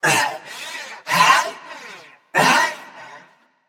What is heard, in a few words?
nv breath; smite; xbalanque